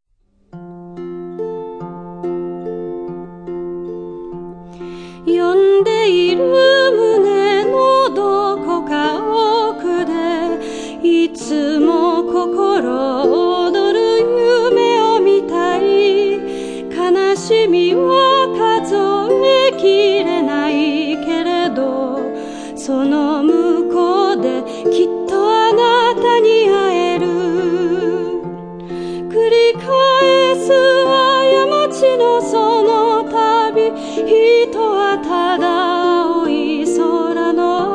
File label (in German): leier